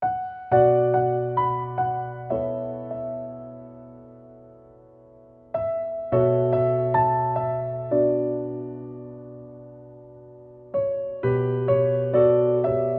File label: Nordic Dawn Piano Only